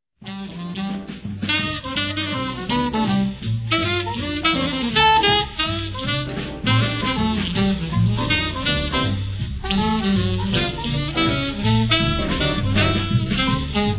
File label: Bongo Bop